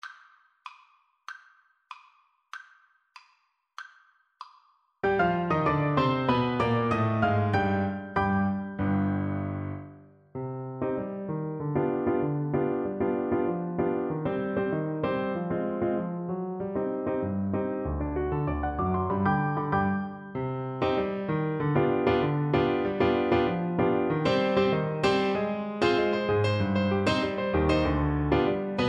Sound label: Tuba